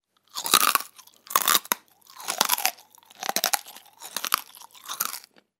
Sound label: Chewing Freid Corn